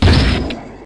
m79 fire; Soldat

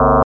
OXYGEN SIGNAL; Titanic Challenge of Discovery Panasonic WIN95

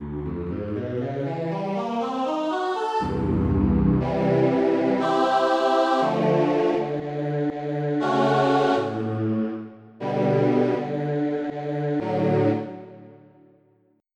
Choir; FF7

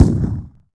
shot, mp5; Shadow Man; weapons

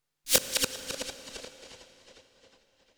FX, ambient, 160; eJay

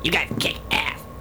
KICK

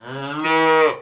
calf; fairy

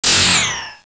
magic

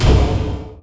Turret Place; State of War

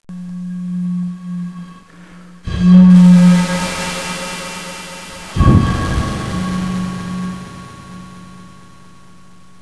BOTTLE

